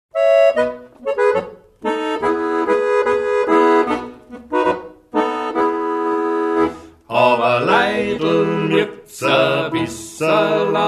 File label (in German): Schwaerzerlied; Die Kuah